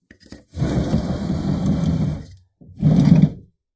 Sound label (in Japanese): 襖をあける音; door